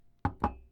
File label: Ceramic Set Down